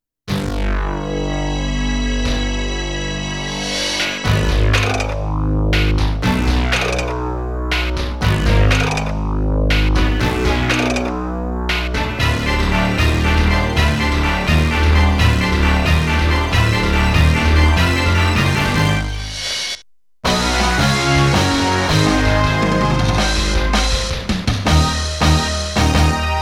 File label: CONTINUE ~ GAME OVER; DEATH BLOW; DEAD LOCK; Scitron Video Game Music Best of the Year 1992